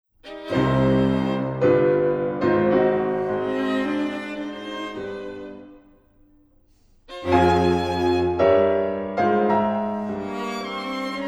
schnell Klaviertrio

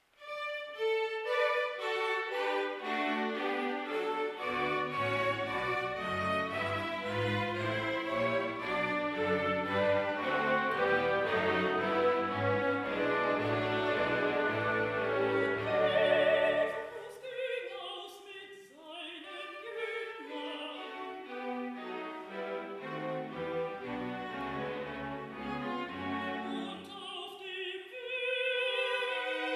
Rezitativ mit Chor; III Petri Bekenntnis; Erzaehlerin Jesus Simon Juenger Chor aus der Hoehe

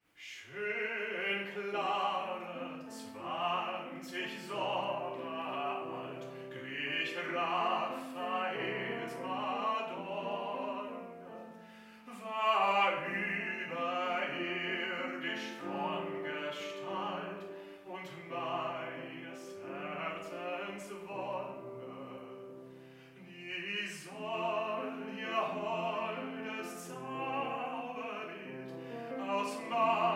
Lied

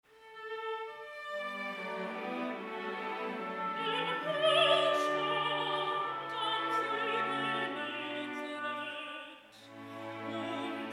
Rezitativ Erzaehlerin; I Der Fischzug; Jesus Simon

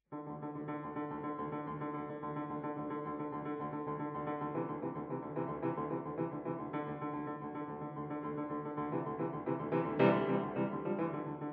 Toccata d-moll

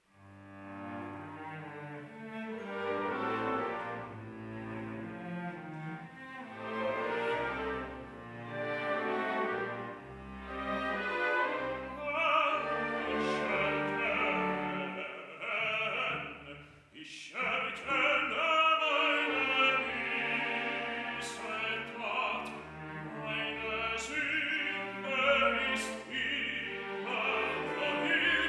Arie; IV Die Verleugnung; Simon

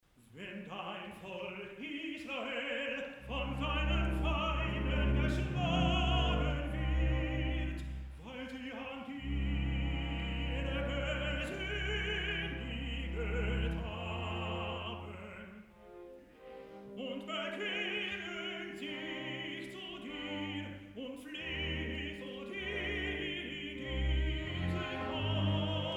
Rezitativ mit Chor; Salomo

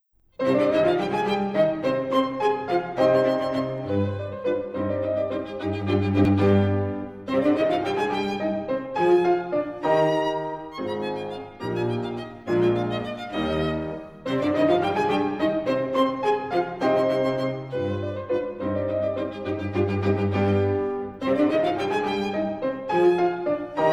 Fliessend Klaviertrio

